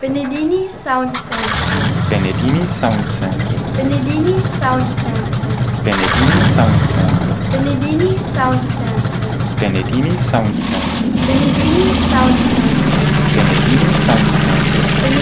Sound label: Panther 6